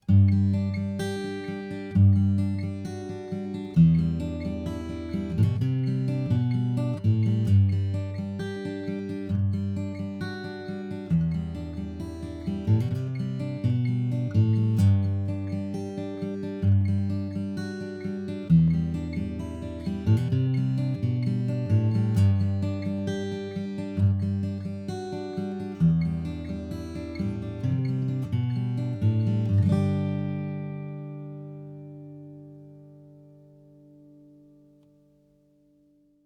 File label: Acoustic pick Pre EQ